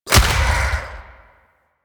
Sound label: Rupture Impact A; Warframe; Warframes